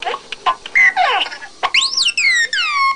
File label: r2d2 squeaks